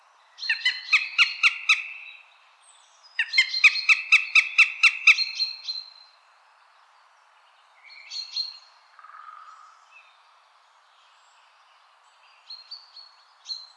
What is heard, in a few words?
Eurasian goshawk